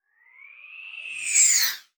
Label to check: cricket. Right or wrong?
Wrong